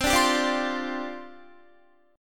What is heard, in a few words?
C Suspended 2nd Suspended 4th, down, Guitar